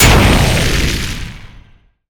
weapon axe crusher

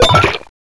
player item picked potion

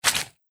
player walk lava loop; footsteps

